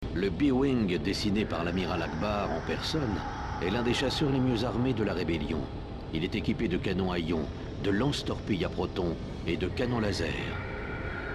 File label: B-Wing; Star Wars